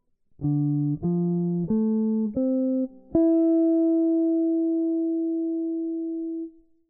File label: jazz guitar improvisation; practice routine